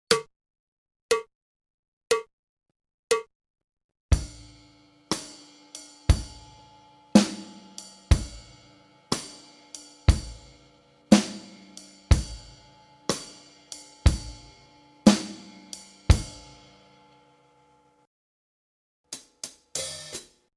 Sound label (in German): Uebung, schlagzeug